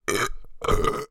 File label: human male burp deliberate